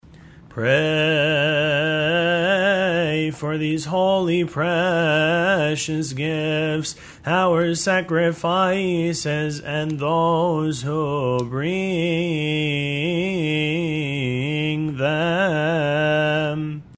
litany of the oblations gregorian

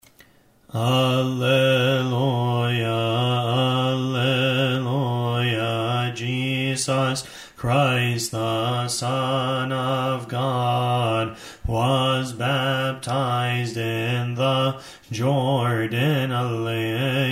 psalm response, hymns

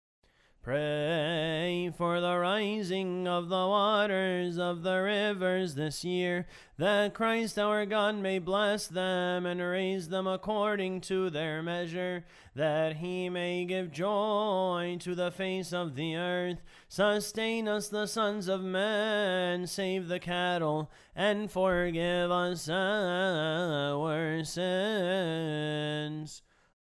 litany of the waters, hymns

